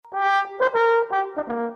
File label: trombone